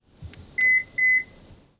Unlocking Subaru; Vehicles

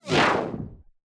Death Enemy; Era's Time Delivery Service

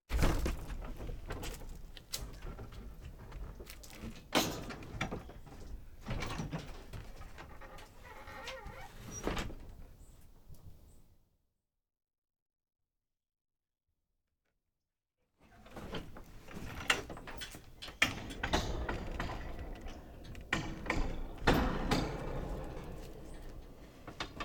Doors and drawers Garage Door; Fischer

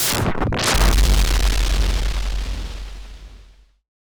Death Player character; Eminent Domain

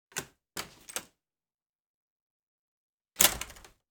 Doors and drawers Wood Door Open Close

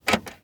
Vehicles Mech Saturn Aura Unlock

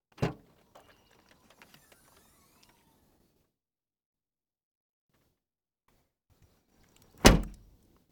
Vehicles Door Subaru Trunk

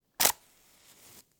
Fire and food Lighting One Wooden Match

